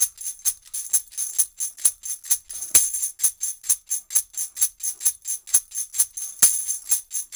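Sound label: TAMB, tambourine; Drumdrops In Dub VOL-1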